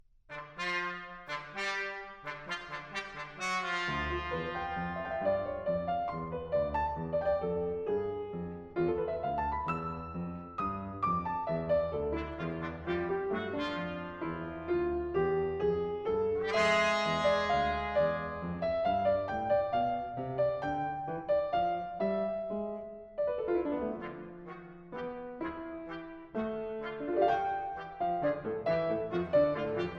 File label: Divertimento For Trumpet, Trombone, & Piano Moderato